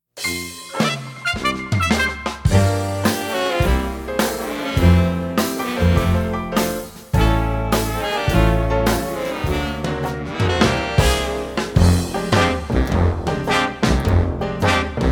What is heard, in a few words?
Karaoke